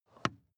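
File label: sports car interior pedal clutch step soft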